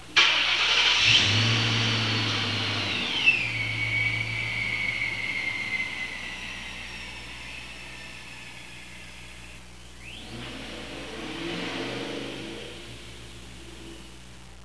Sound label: drive off; '98 Procharged GT